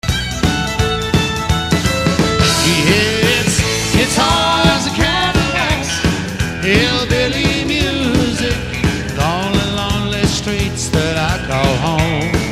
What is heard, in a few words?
Country Guitars